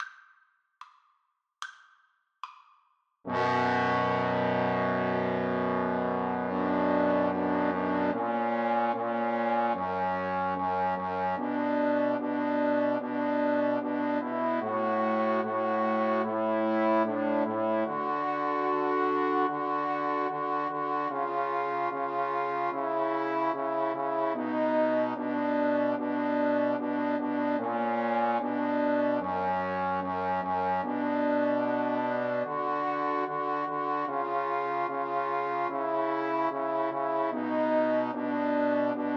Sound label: Trombone Trio